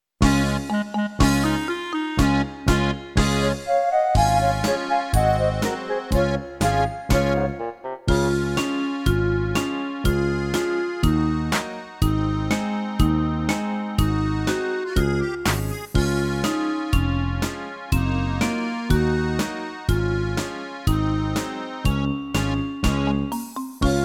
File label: Karaoke